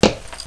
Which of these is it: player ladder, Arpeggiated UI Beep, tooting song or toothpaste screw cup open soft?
player ladder